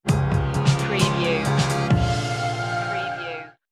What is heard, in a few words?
stinger, An uneasy, ambient rock track with noir; indie rock